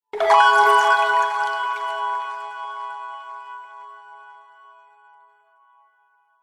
multimedia game sound calm soft mellow watery ping